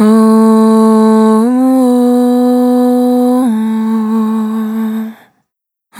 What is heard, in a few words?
Single Voice Melodic Loops, Vocal, Dry; Sony Creative Software; Pocket Diva World Class Vocal Samples; Discovery